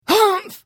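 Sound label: Hit, Khajiit female; The Elder Scrolls III - Morrowind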